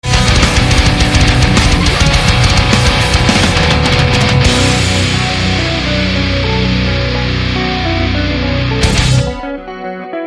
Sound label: chaos incineration loop